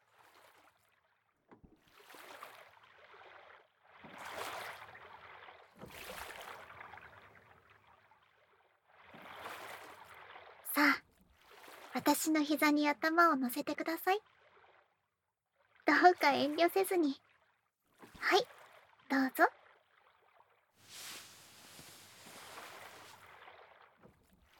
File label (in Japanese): 耳マッサージ～耳かき